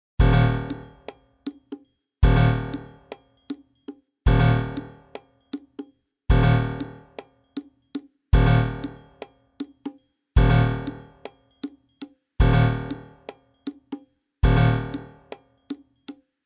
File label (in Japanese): 緊迫曲